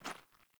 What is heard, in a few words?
Grunt Boot Walk Gravel, Footsteps; Characters; Enemy; Melee Grunt; Boots; Sinecure